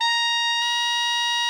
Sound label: TENOR, Sax; Giga Samples Collection